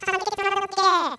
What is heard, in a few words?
ufo